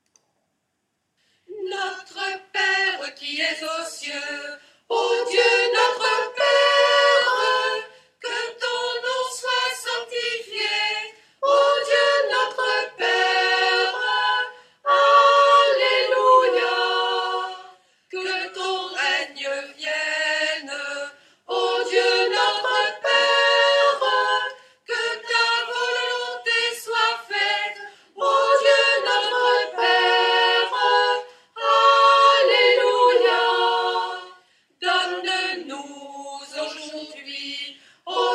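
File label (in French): Notre Père Burkina Fasso Soprano, chœur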